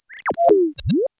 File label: SFX appear; GONNER